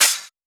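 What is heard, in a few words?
Open Hat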